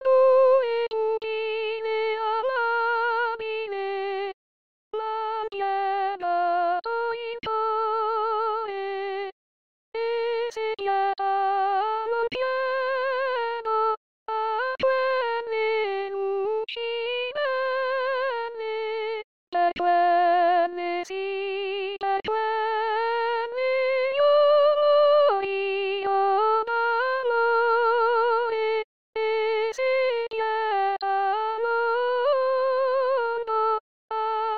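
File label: soprano; KV 439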